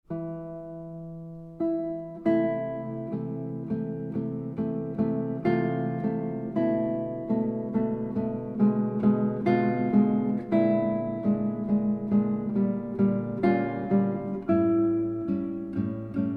preludes op 28 no 4 in e minor largo arr for guitar; 24 preludes op 28 no 4